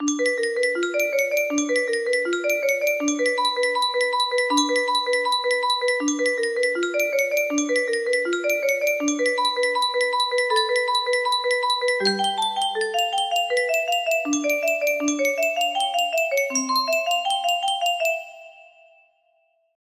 f scale, music box melody

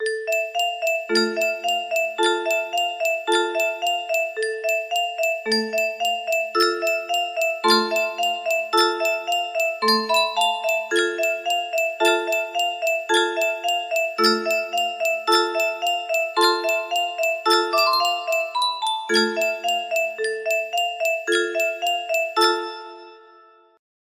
piano duet, music box melody

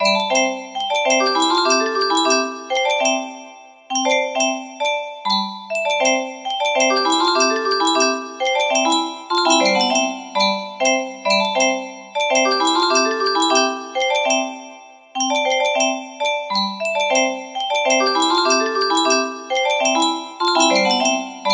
ice cream truck, music box melody

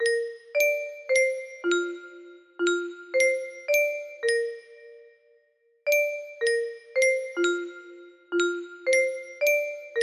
westminster chimes, music box melody

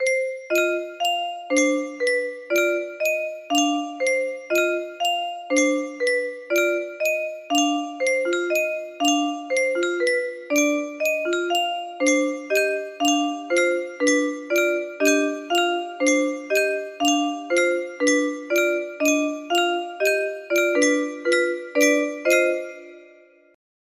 music box complex; musicbox puzzle1

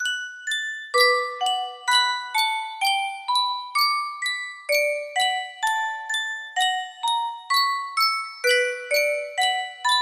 Yunsheng music box, music box melody

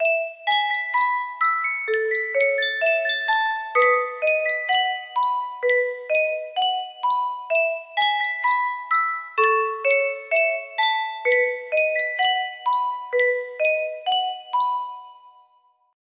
yunsheng custom tune music box

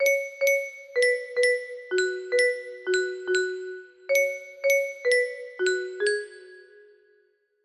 music box

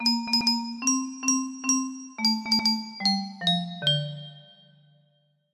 melody, music box melody

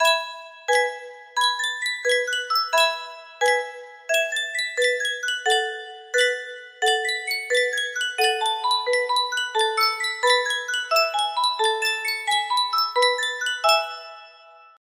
music box, boite a musique; sankyo

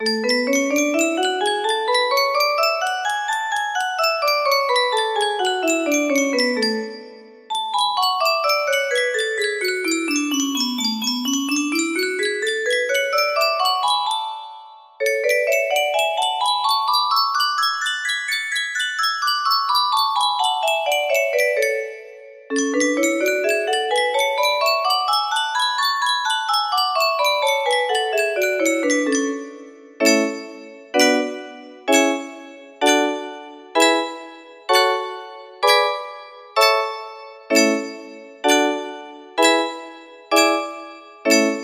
a major, music box

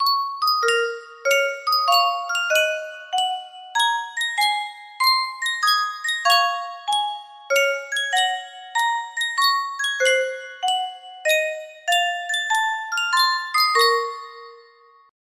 Yunsheng custom tune music box

